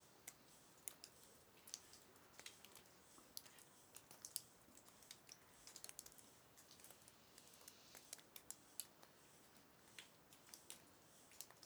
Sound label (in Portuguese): Muito gotejamento na entrada da caverna do ramiro gravacao em ab com cigar; agua